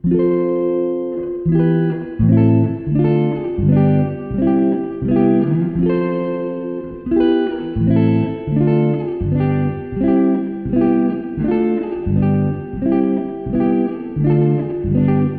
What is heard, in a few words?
Electric Guitar